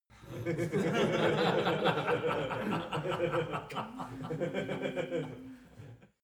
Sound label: laugh long